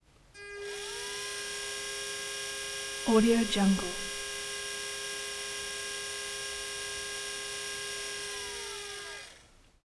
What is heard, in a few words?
electric wheelchair; prosoundfx